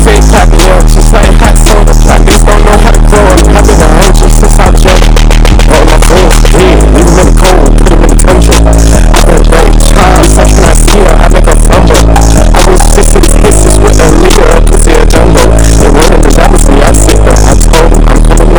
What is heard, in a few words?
bass